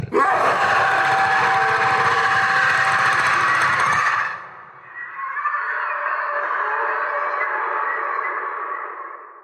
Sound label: monster scream aggressive